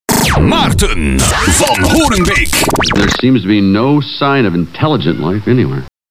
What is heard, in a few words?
jingle